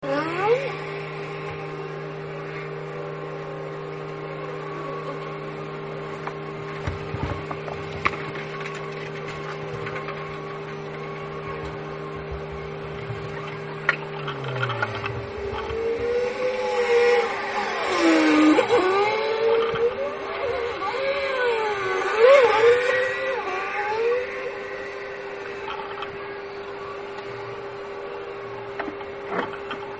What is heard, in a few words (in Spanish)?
grabaciones de campo